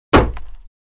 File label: Ax Impact Wood, axe hits